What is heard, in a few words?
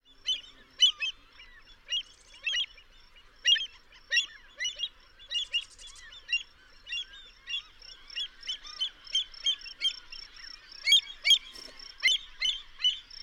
avocet